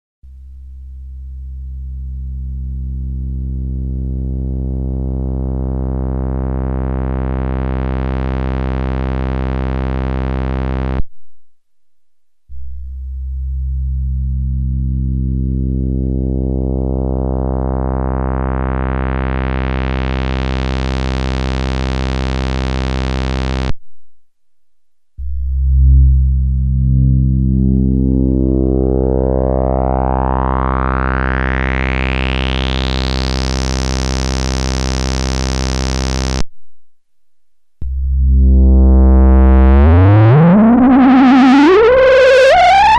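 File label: CHARACTER SWEEP SOUR; Redshift 6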